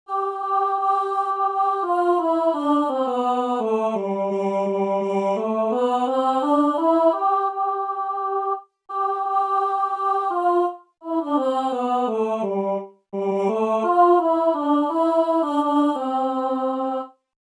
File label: Chorus Tune